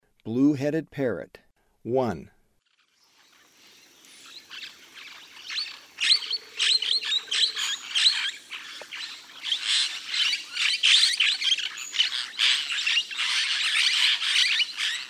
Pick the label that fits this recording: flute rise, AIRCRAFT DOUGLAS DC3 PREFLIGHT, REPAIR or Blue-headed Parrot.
Blue-headed Parrot